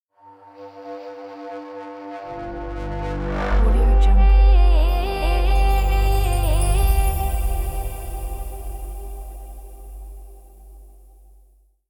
ethnic logo music

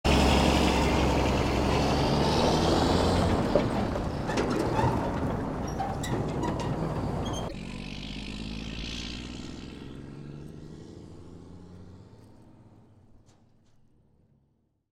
large vehicles sound fx

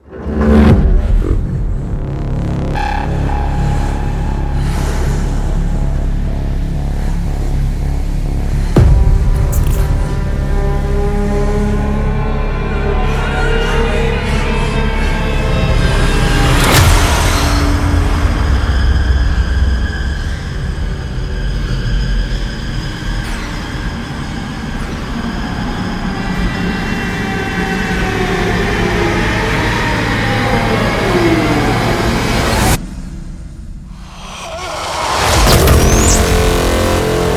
mist sound; cinetools

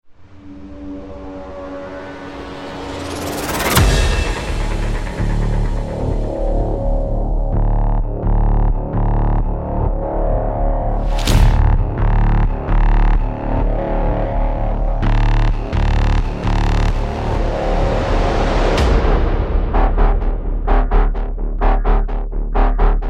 cinematic sound effects

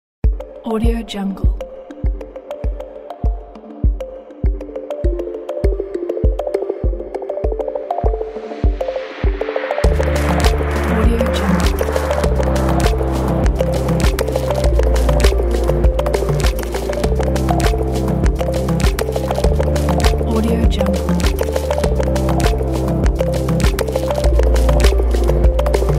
technology ambient music

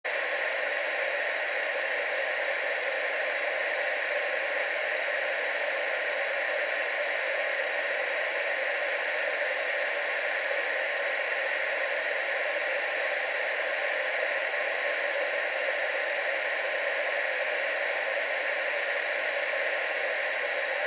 tvs sound fx; blastwave fx

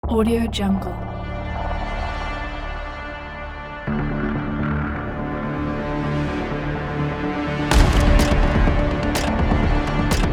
cinematic suspense music

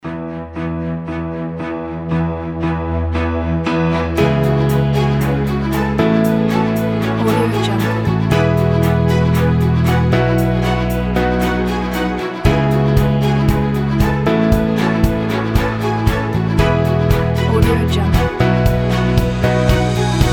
corporate uplifting inspiring and motivating

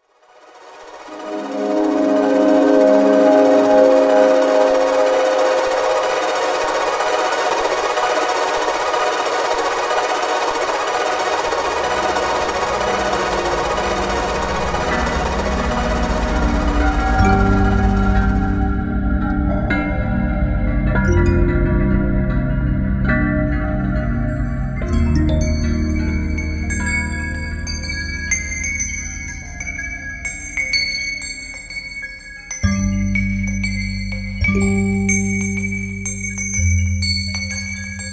ambient

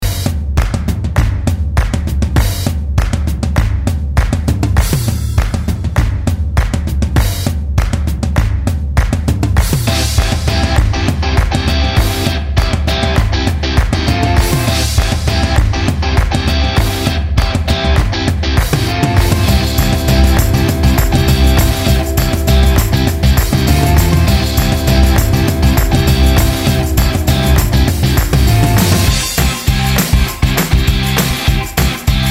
happy school days music

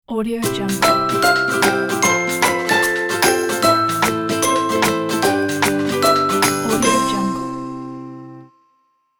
motivational promotion logo music

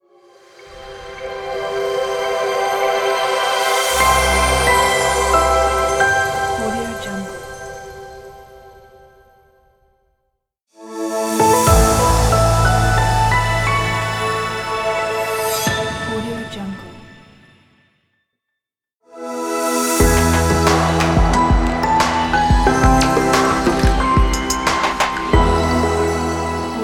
intro logo pack music

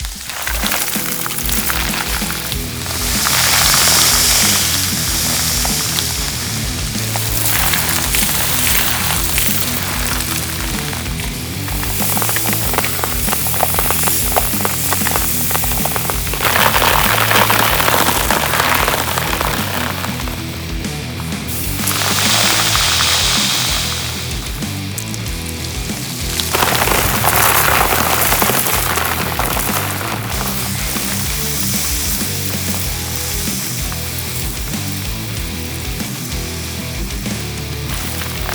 destruction dust and dirt sound fx; sfxtools

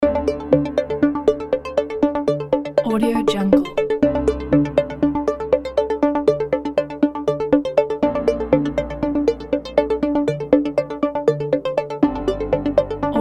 digital technology upbeat background music

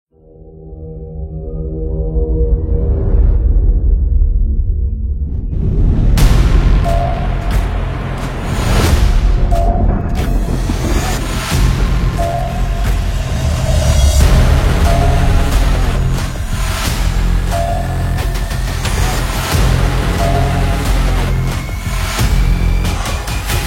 cinematic sound fx

